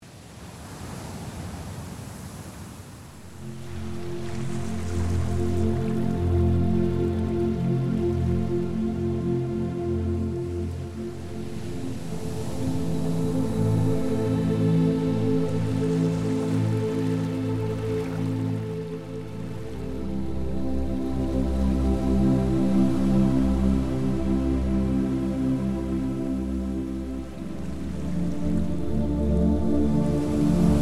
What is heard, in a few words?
Music With Ocean Waves; Ketamin Session Theme 1; musikk til psykedelika assistert psykoterapi